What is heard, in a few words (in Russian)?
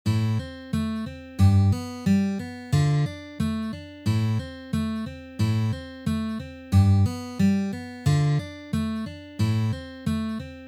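perebor kuplet, na gitare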